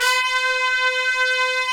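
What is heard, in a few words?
trumpets section; Roland LCDP06 Brass Sections; Tpts Sec.1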